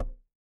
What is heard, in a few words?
impact wood knock